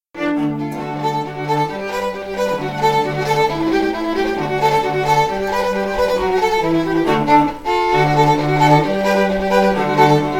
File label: bluegrass country